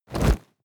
drop backpack leather

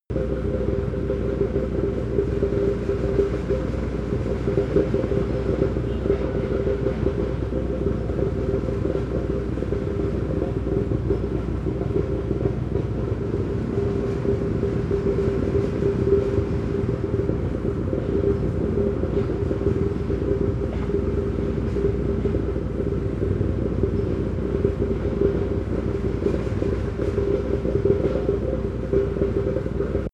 construction site machine rattle

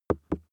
plastic cream bottle hit soft

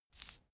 cigarette sweep smooth

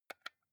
console controller xbox onoff press fast soft

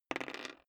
dice roll table soft

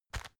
tissue package pick up

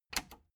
plastic button latch loose click enable fast

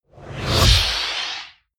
whoosh air

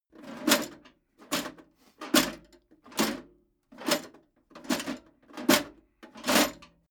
metal plate armor movement sequence

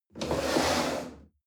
chair wood slide on floor hard

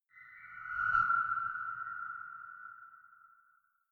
whistling chirping

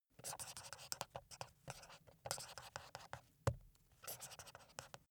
marker pen write paper sequence

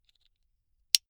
buckle plastic insert close soft

